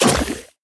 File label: dragons enemy acid alfa attack